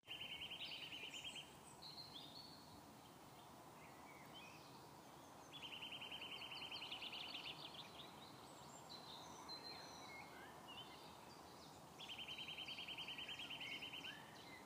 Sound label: alarm system bird